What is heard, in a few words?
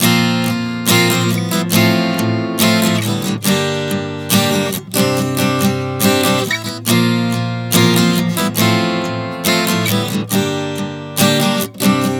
Progression 070 Bbsus9-F-Gm-Csus9, Acoustic Guitar, Chord Progressions 070 bpm; Sonic Foundry; Songwriter Acoustic Guitar Companion